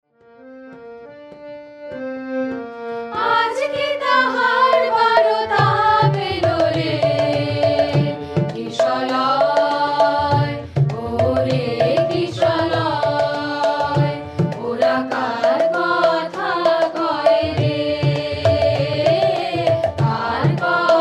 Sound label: Aaj Ki Tahar Barota Chorus; kolkata